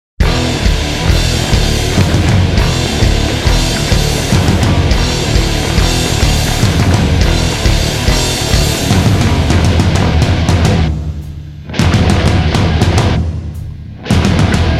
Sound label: Hard Slam; Soundzabound Volume 3 Disc 1